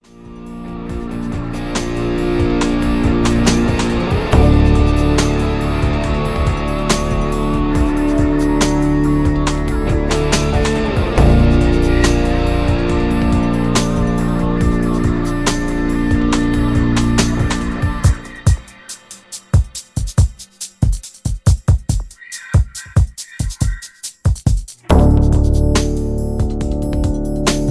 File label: Inharmonic